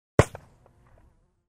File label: gun